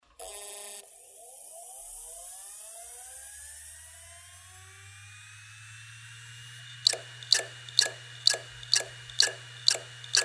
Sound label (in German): WD1002FBYS-02A6B0, Festplatten Geraeusche